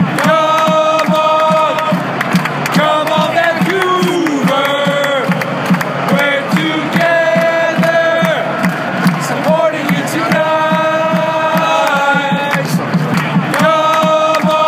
come on, chants; vancouver

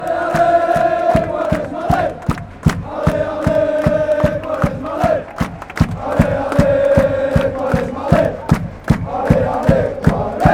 allez allez allez, fan chants

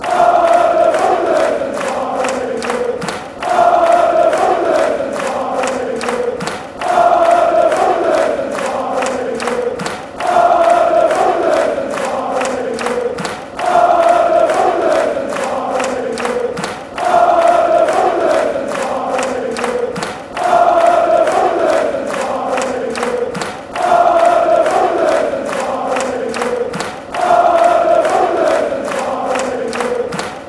all pigs, fan chants

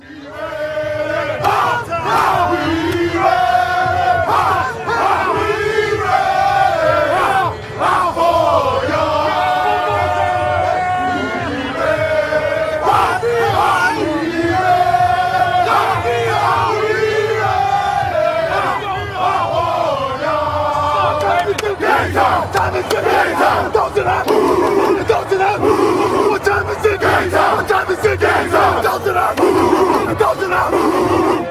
pre game chant

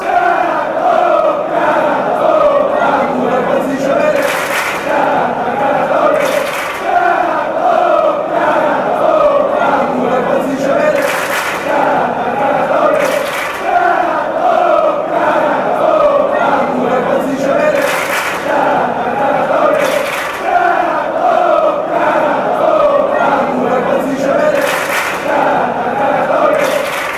granata fanchants

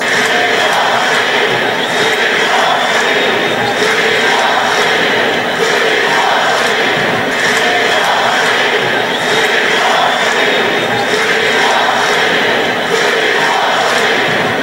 we want, fan chants; steve

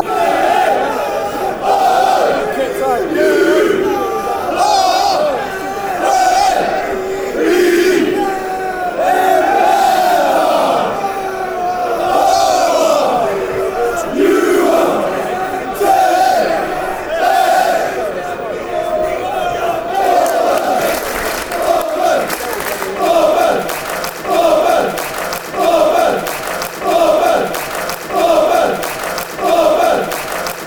spell it, chants; bournemouth

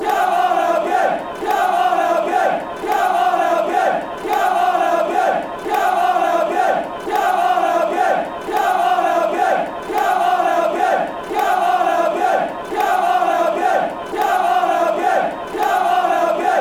come, chants; albion burton

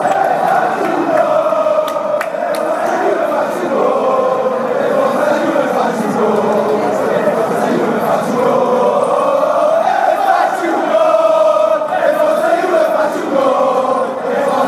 a goal, fan chants

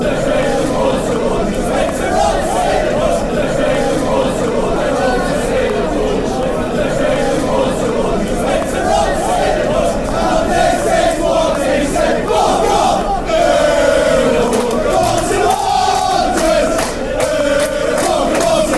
went rome, fan chants; bfc

